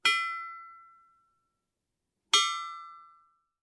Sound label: Metal Rings